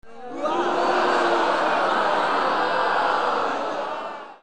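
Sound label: exclamation; vozglas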